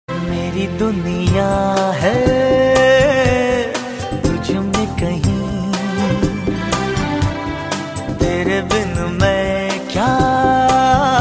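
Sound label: Classical Bollywood Song Ringtone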